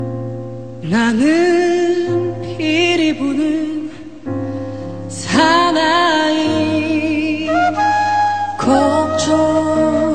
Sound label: korean ringtone